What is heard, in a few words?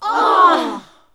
NOISE, CLASSIC VOX; Best Service; Hallelujah